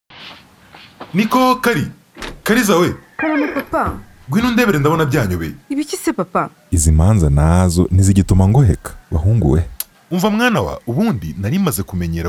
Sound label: Jingle; IECMS; Judiciary